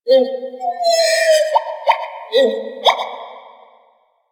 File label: Valley Night Critter; Ambience; Warframe; Quests; The New War